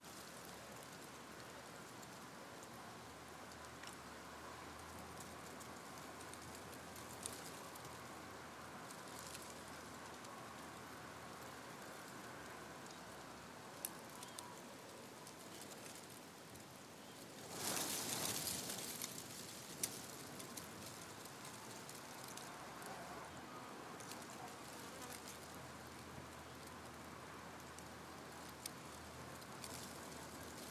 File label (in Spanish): VIENTO ENTRE HOJAS SECAS CAÑÓN DEL COLCA; aire Ambiente arequipa ruido